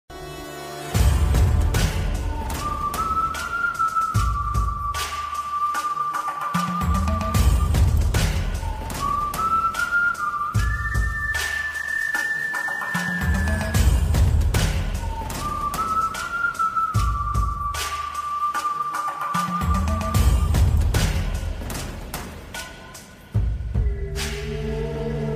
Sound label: whistle bgm; acharya